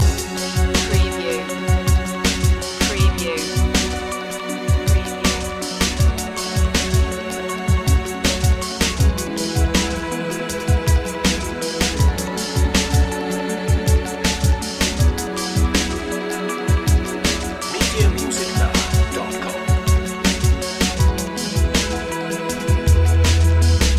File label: blue strings loop